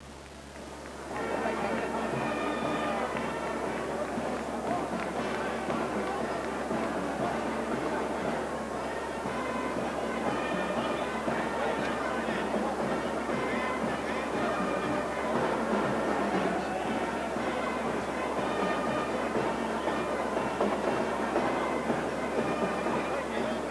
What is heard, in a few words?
band, parades